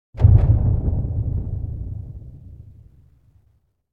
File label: Impact Deep Strike - Fast Double; Hollywood Edge; sound designer's toolkit v2